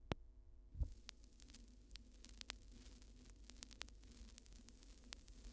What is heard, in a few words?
Vinyl Crackles